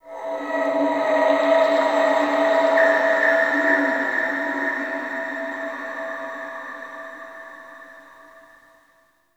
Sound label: Factory Texture, Ambience; Warframe; The New War; Zariman